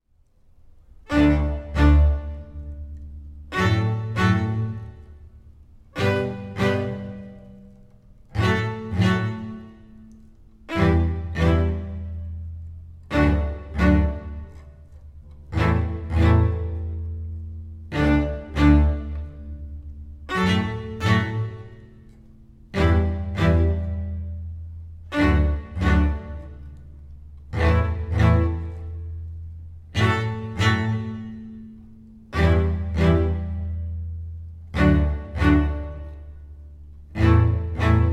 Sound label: Twinkle orch; Twinkle Stop